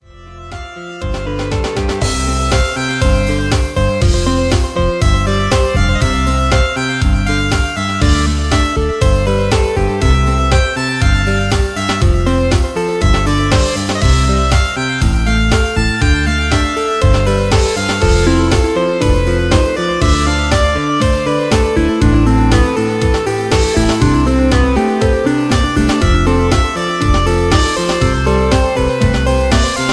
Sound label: synth solo